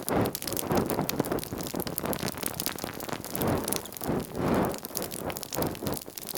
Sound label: Temple Pillar Fire Loop D; Warframe; Ambience; Orokin Derelict; Red Veil Temple